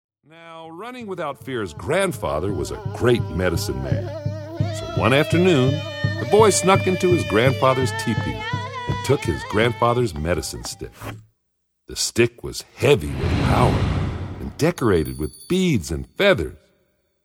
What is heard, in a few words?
rainstick